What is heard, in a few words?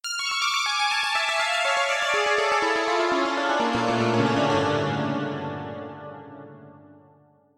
synth piano binary piano